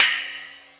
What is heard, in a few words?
Rock N Roll, E-MU Pro-Cussion